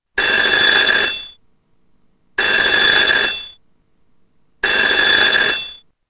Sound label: old phone ring